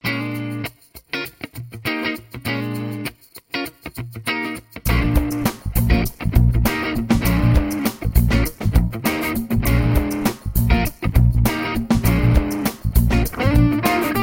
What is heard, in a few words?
SD-1 Plus mosfet